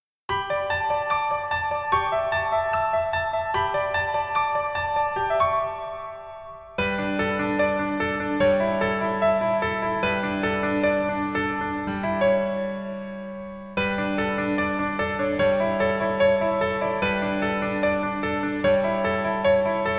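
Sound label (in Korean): Piano version 멜로디MR 여자키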